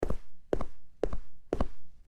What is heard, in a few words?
footsteps sneaking; Sound FX